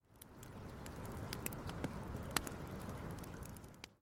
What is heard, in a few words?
fire